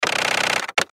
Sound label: Button Switch Gear Multimedia